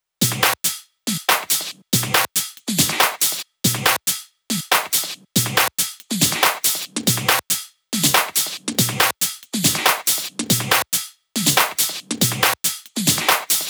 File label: drum Loop 140BPM Full